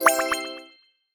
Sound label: success